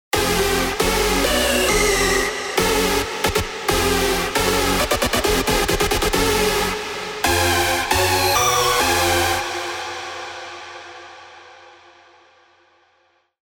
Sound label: Stab; revealed spire mainstage drops